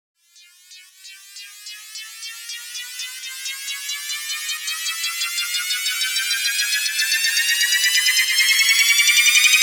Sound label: Riser 174bpm Bm, LIQUID; DNBACADEMY